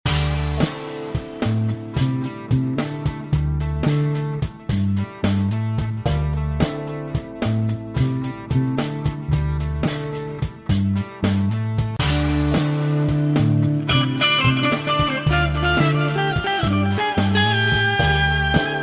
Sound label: groove